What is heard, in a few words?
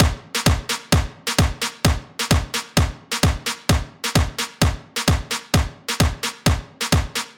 4 tresillo edm